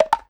Perc Wood 2 Tone; pitchglitch

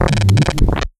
Glitch Gulp

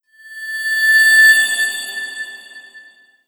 Crystal

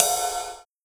Ride Hit Short; Classic626

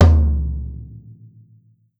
Tom Low

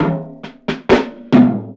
Tom Roll n Break; Drum Digger

